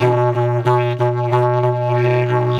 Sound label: Didgeridoo; DIDGEHORN2